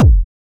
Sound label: G House Kick - F#; Drums; One Shots; Kicks